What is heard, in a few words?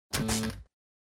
Horror Accent Short